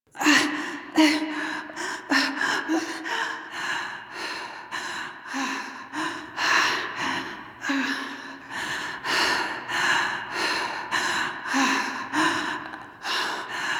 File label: Landing Breaths; Warframe; The New War; Cetus Landing